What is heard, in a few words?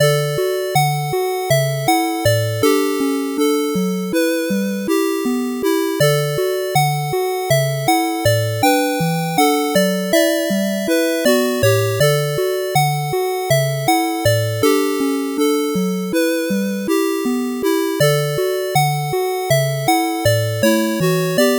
8bit bgm slow